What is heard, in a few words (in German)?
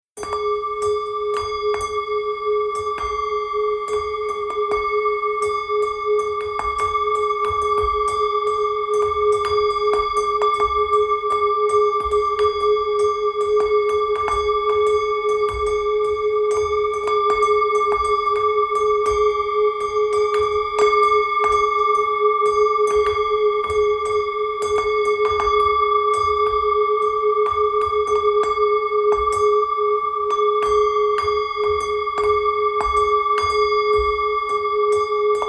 klangteppich schnell, 42 hz; der mond 210